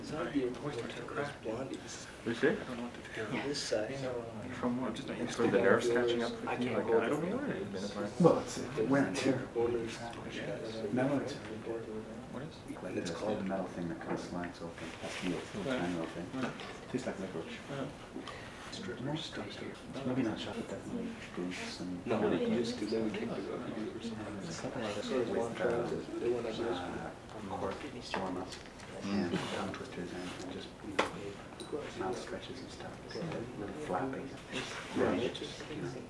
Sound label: ambience ppl